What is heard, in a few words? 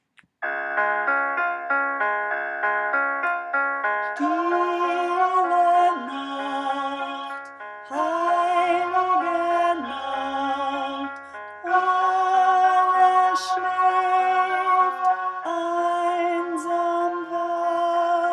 Stille Nacht alto, Folk Choir; Voices of Remembrance resources